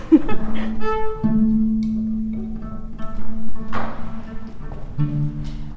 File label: laughing under the piano binaural headphones